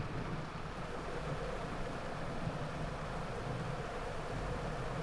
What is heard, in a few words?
quiet boat